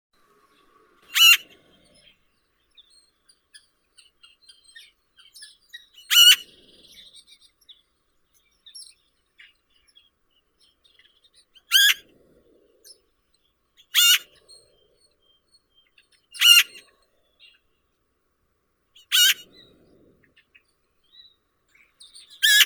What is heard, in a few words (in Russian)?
soroka pica pica, zvuki prirodi